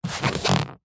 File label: office chair leather executive short movement squeak creak